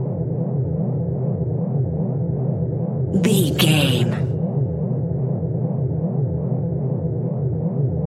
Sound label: sci-fi force field hum loop